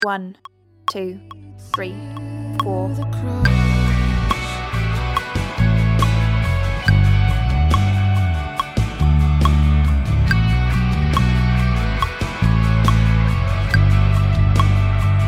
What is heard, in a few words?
bass